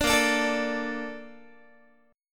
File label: A sharp Major 7th, Guitar; strummed